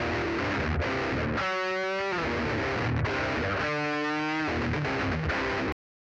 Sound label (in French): guitare satu